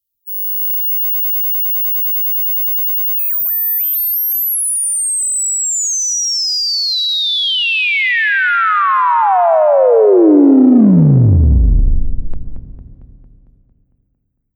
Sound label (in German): SH 101 selbstoszillation